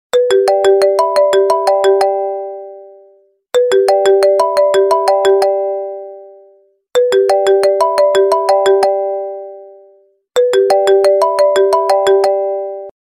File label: Tiny Ping Pong